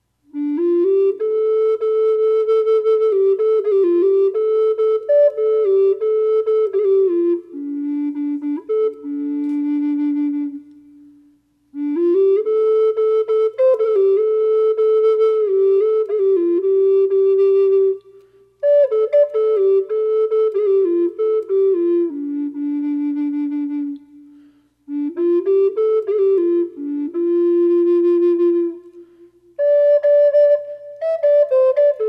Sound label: white spirit bear reverb, flutes